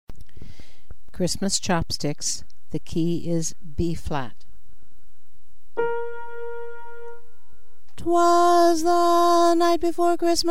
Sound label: Xmas Chopsticks Lead Dominant; holiday music